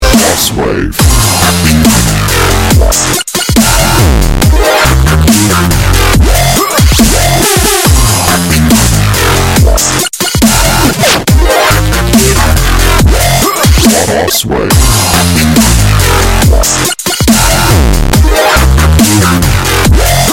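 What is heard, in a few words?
electronic drum and bass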